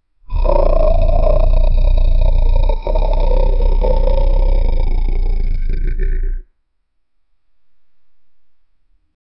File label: ferocious demon laughter; supernatural